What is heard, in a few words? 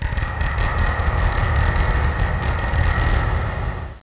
creepy; svencoop